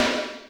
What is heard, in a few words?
soft hit normal